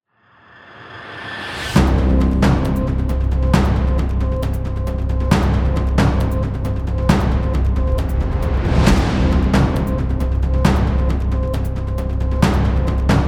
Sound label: Tension